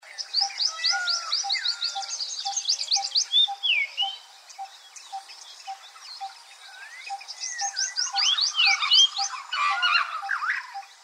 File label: Birds in dry forest